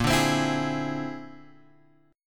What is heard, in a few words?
Bb 9th Flat 5th Bb down Guitar Open G